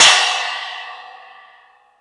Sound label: Space Drums, Drum Machines